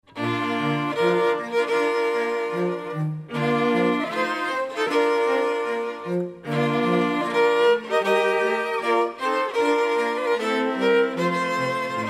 Bridal Chorus, string quartet

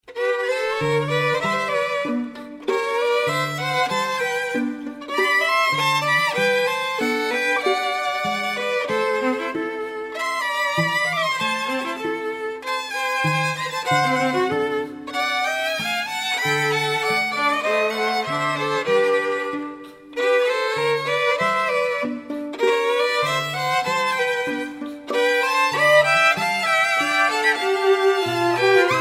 Tango, string quartet

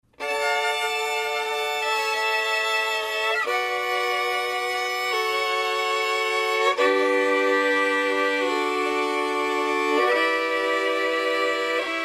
Canon in D 2 violins; Canon in D (Pachelbel)

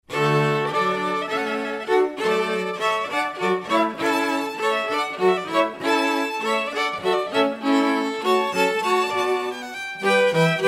Hornpipe, string quartet

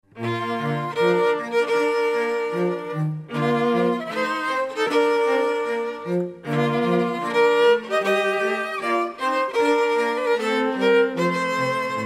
Bridal Chorus Trio, string

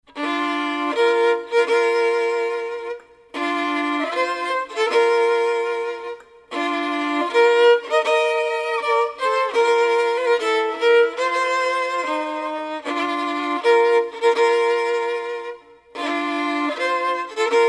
Bridal Chorus 2 violins; Bridal Chorus from “Lohengrin” (Wagner)

